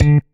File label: marimba; ゆめ2っき